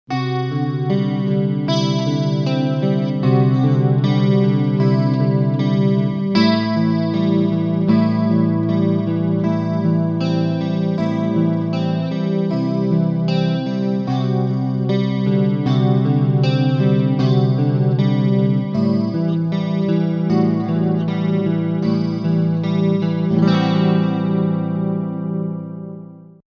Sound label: Single coil Front